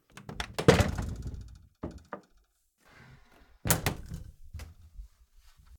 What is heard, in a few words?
cupboard door liquor; sonniss gdc